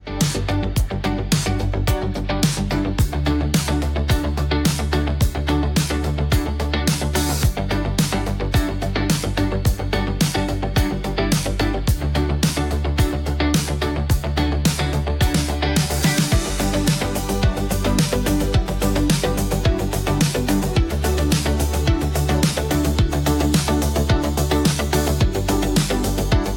shopping vibes, business music; business music pack

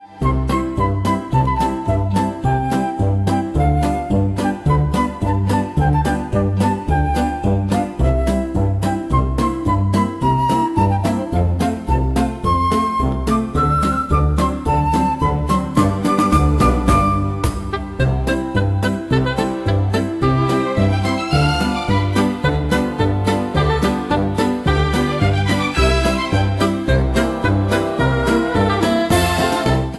triumphant win

